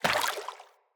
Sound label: Grunt Step Water, footsteps; Enemy; Corrupted Sproutfolk